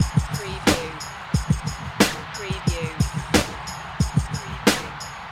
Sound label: Hip Hop Drum Loop 90 BPM - With Crowd